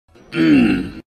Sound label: Sully Groan